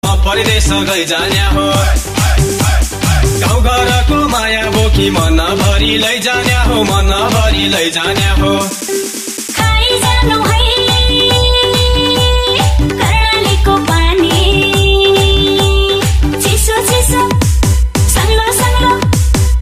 nepali sad, ringtone

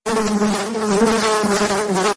bee notification tune

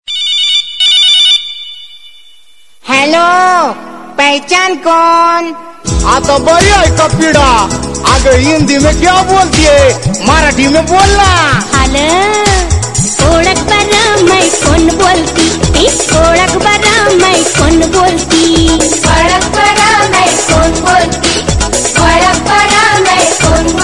marathi tune

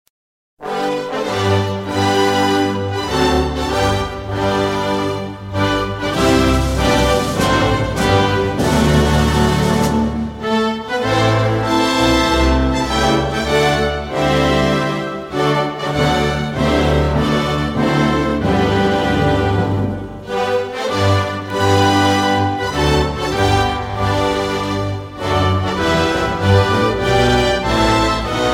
indonesia romantic, ringtone

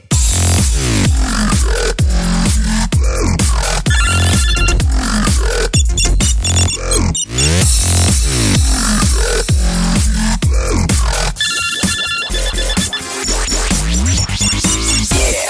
electro call dubstep

